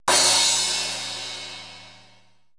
Procussion Mix, EMU E-III; Retro Sampler

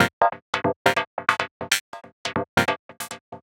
synth 140 harmo 5ths C G; Transmission-X

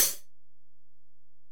HiHat, Alesis DM-PRO

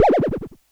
Wibble, Synth FX, cartoon; musicradar; cartoon caper

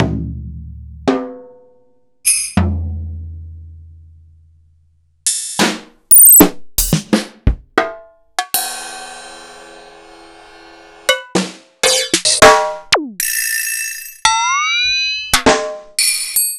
EMU PX-7; m8

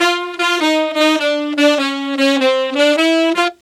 Sax Section in F, Jazz; Traditional; Sonic Foundry (Sony Creative Software); Jazz Solos and Sections

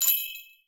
GARDEN, BELLS CHIMES; Voice Crystal - Steve Reid Definitive Percussion

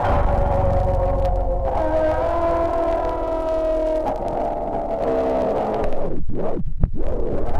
Slow Down; Sonic Foundry (Sony Creative Software); Hydroponic Hip-Hop

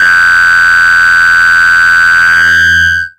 TRACK BELL, Hard & Loud Techno; USB Soundscan vol.01; AKAI

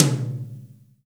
TOM, Drum Dry; East Collexion; Drum 1

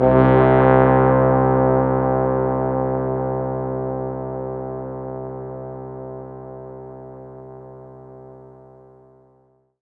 C3, Synth Line; 90 s Sample CDs; NiceBeats; SynthLine VOL-24

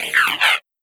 Criket Fill, Esoterik Beatz; Cricket

